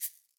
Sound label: shaker single hit; Slate; SEISMIC Drum Sample Pack